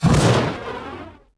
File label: rocket launch; alienshooter